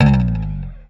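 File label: slap, bass; Xsample VOL-1; SLAP+THUMB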